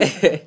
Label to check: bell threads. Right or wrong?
Wrong